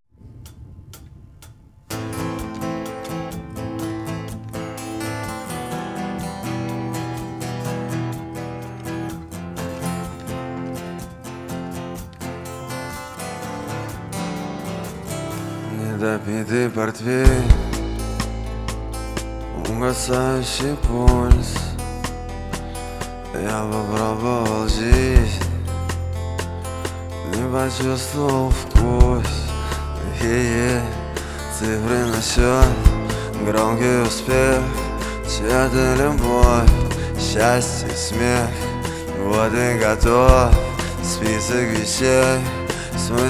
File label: acoustic live